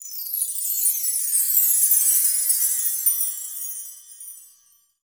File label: chime sparkles